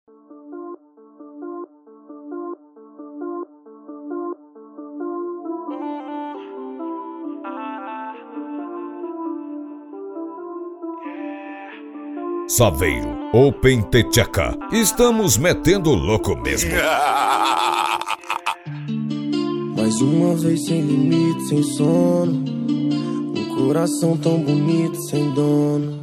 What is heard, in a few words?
bass; saveiro open the theca